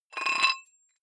dumbbell thread take off weight soft, fitnessstudio